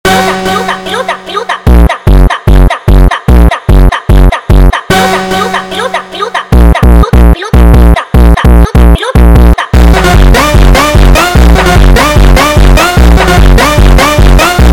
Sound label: Funk Sped Up